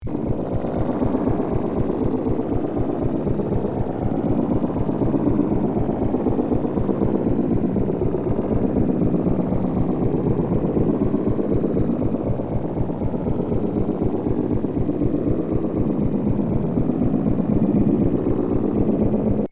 space ship floating sound

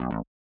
pacman wakka

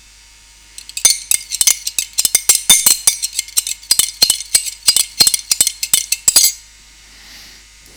stiring cup long

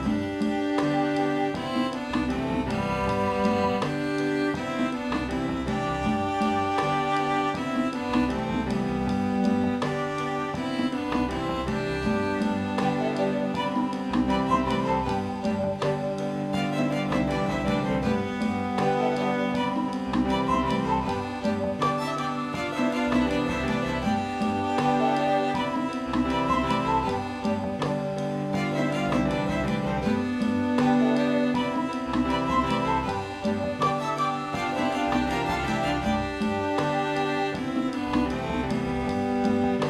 happy game theme loop

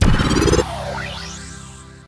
digital coin

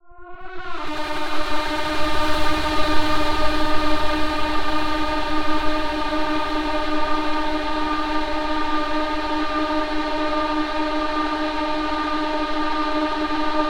Zombie Ambient

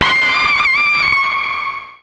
Scream

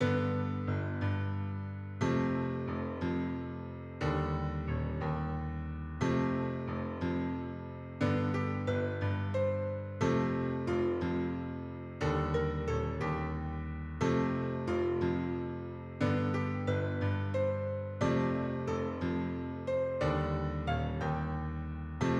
peace at last piano

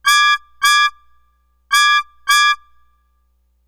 Alarm

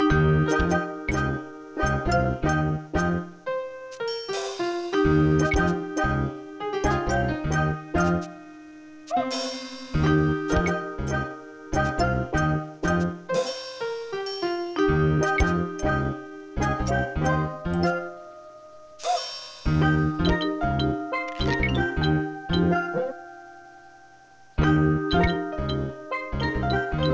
jungle short

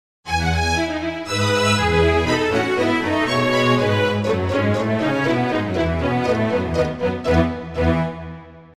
strings reverb

